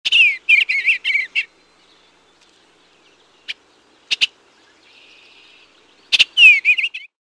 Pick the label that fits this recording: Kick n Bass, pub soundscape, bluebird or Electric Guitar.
bluebird